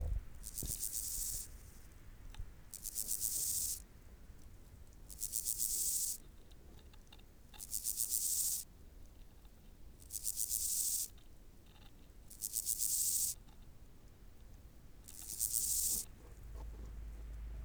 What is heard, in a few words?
Chorthippus dorsatus; Kontaktlaute zweier Männchen von Chorthippus dorsatus